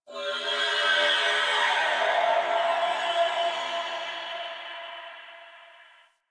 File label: Chimera Portal Cry One Off; Ambience; Warframe; DE